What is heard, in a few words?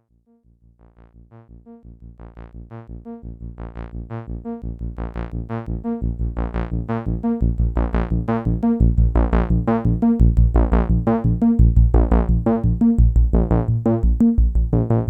Dominion X Simple Sequ Sawtooth